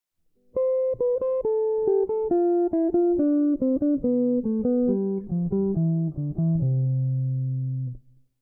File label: jazz guitar improvisation; practice routine